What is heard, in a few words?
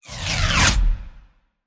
Ribbon Whoosh